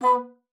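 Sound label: B3, bass flute; Xsample VOL-2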